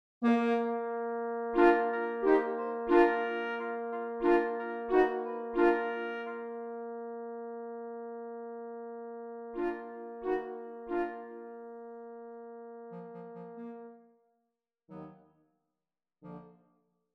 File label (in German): Floete